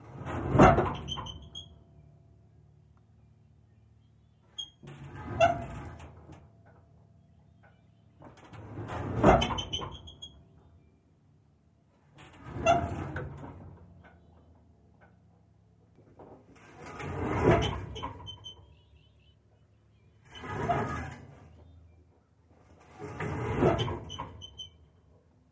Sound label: Car Trailer Jiggling; hits & rattles